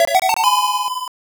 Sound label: Coin; Track & Field; arcade